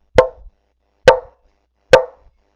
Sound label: 1929 Gibson Style 4